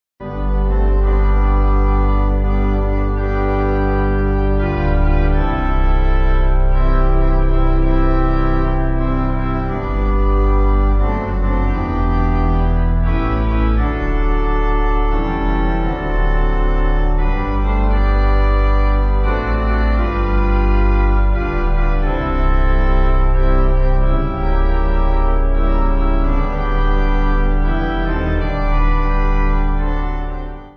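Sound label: Pipe Organ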